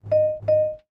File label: petrol end; DeadlyRace